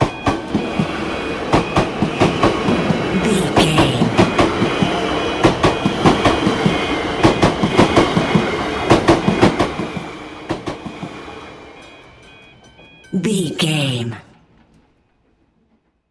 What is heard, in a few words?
train train tracks pass crossing